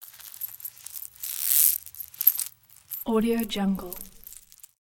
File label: foley jewellery necklace jewelry handle slide; جواهرات و گردبندها